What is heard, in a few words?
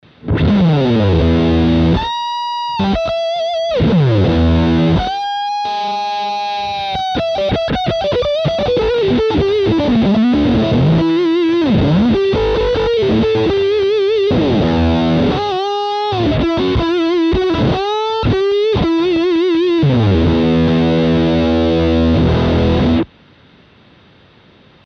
EONS Mode II Gain max Lead; Volate